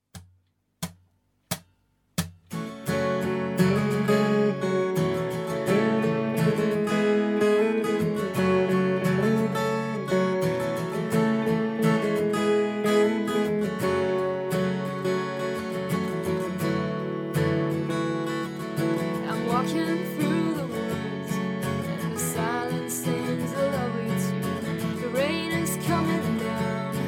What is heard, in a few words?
raindrop solo